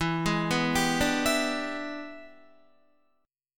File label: E 7th Sharp 9th E down Guitar 4F 4A 5C 5G 5C 6E; E7#9 arpeggiated; JGuitar